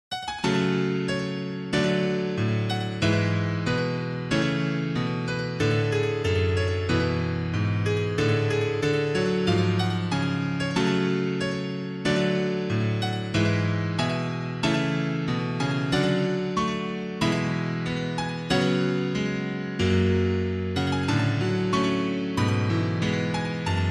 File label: piano; soleil-musique; Fluid R3